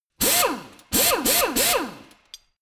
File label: impactor salve metal rattle; maschinen; bohrer